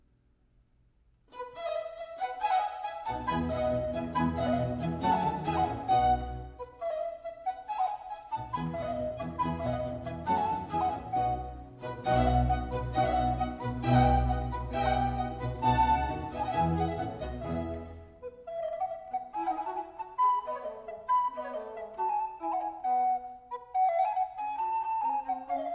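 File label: Concerto F major, Blockflötenkonzerte; Antonio Vivaldi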